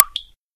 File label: Small ambient waterdrop; STALZONE